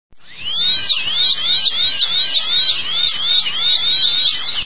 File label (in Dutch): tandartsboor